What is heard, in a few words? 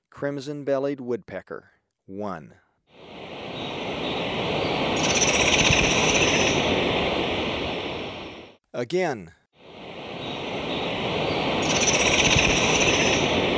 Crimson-bellied Woodpecker, Birds of Ecuador; S. America